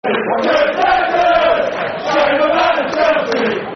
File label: Football Chants (Soccer Song); Shame About The Chelsea; Tottenham Hotspur Thfc